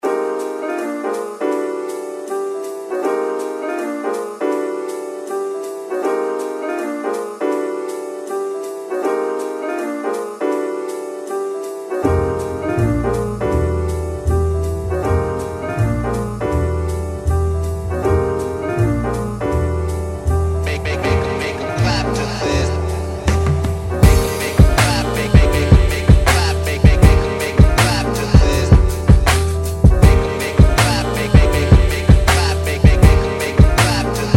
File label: Clap To This